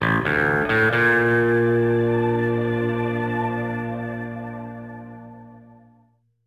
Wheelie Flourish; Bolt

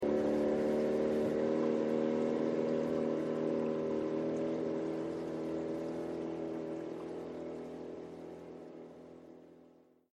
Boat Away

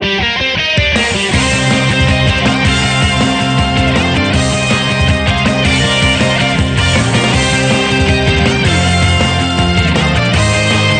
Music Game General event

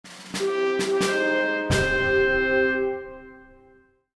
general promote

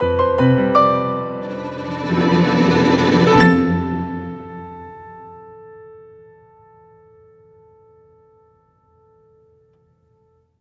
Music Level Stinger; Florence

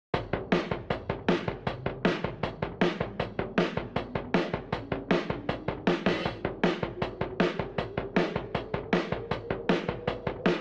Alt Rock

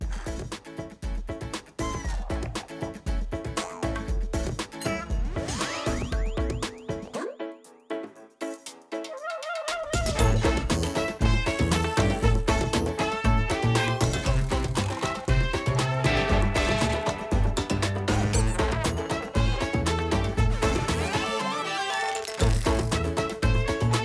Background Music; 101 Dalmatian Street Boom Night Rescue

